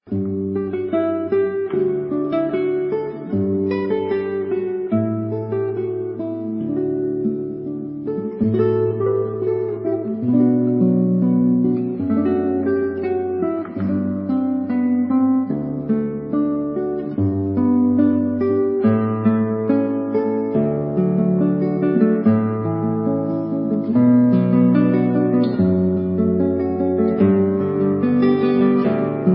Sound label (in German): neues gitarrenspiel